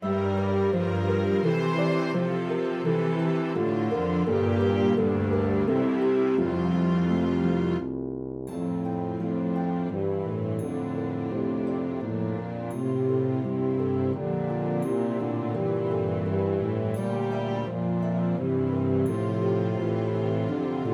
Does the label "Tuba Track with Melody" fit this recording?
Yes